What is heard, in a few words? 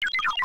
Beep-Blueprint-Place Rev